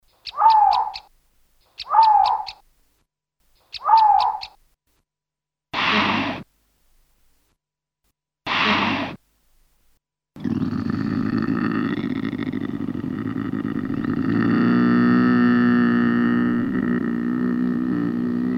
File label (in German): Luchs